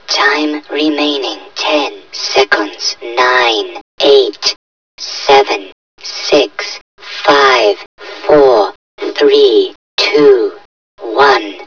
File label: countdown